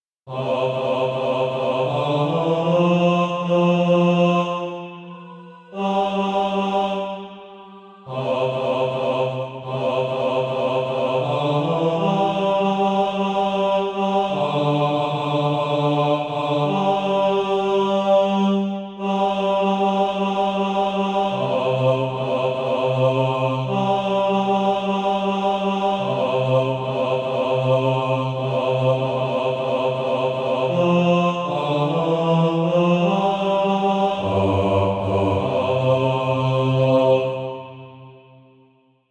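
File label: bass, SATB Practise Music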